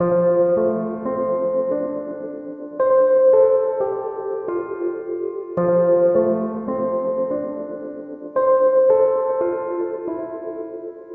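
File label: 172 C minor Keys Lead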